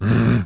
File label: MMMM